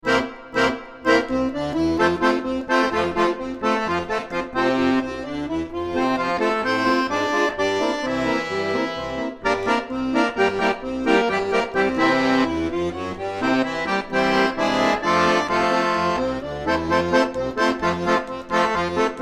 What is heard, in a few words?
Polka; wedding music